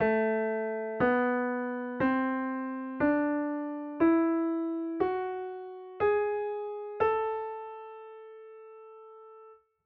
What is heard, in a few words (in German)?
melodisch moll